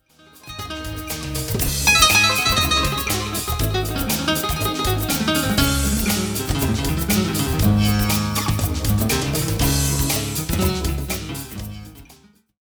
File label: Flamenco